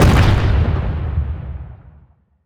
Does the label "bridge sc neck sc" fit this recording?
No